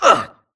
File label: grunt hits; Titanfall 2